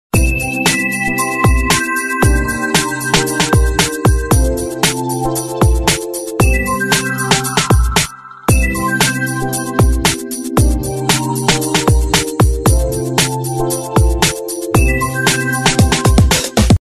ringtone liquid metal; acer

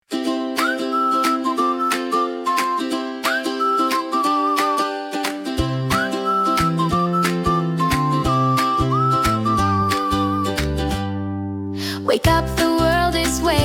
schastlivaia detskaia muzyka

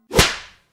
big slap

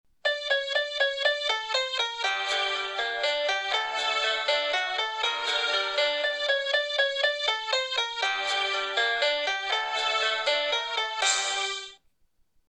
tune furelise

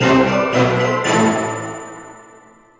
flag lose; brawl xmas

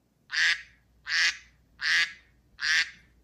duck, iphone os 1

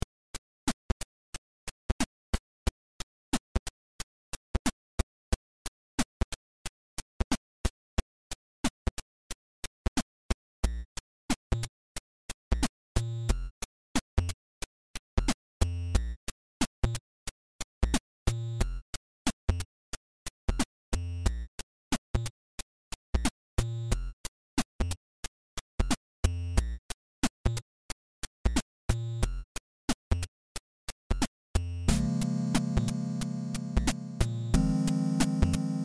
8 bit music

